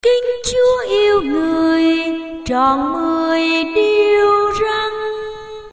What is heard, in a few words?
sop tenor